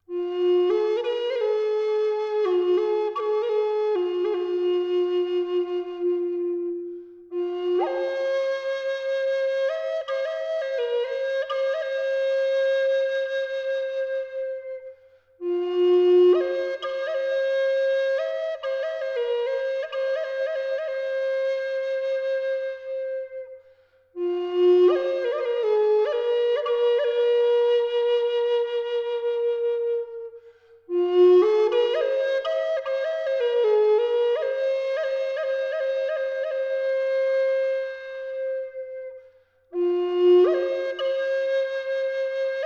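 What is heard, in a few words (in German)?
Wacholder Fis 432 Hz; Bergkristall; Feder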